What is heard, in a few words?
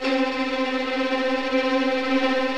TREM VIOLINS